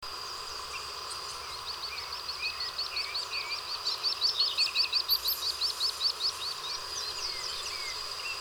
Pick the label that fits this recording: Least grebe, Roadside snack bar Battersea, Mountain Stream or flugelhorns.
Least grebe